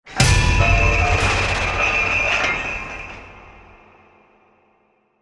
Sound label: metal crash impact